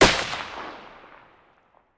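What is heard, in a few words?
weapon ar2 suppressed fire player atmo exterior; gmod; viper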